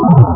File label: Shield; boulderdash3d